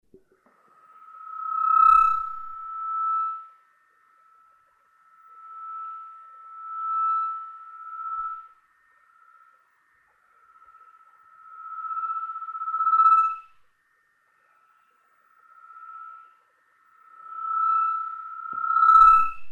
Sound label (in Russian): obratnaja svjaz mikrofon fonit